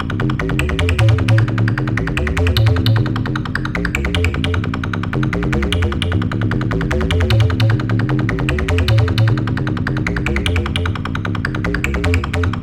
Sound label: boss fight bass